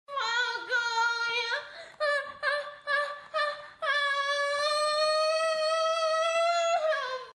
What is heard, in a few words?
cum, moans; The Witcher Hunt